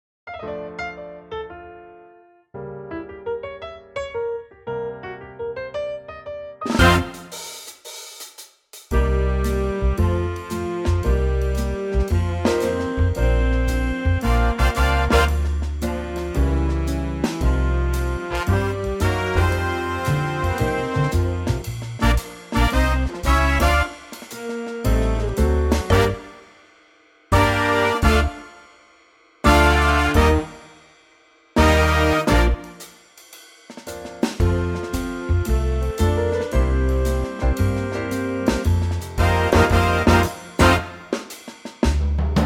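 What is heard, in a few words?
Bb, backing track; key - Bb - vocal range - G to Bb; Corny old music hall singalong gem in a lovely Big Band arrangement.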